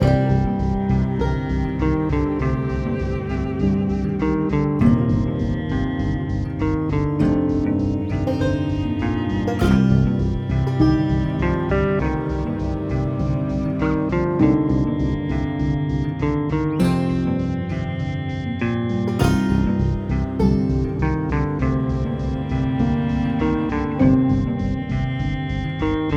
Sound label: Tension Loop 100bpm 16bar; project zomboid